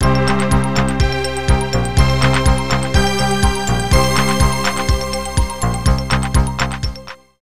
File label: Fanfare; The Untouchables; snes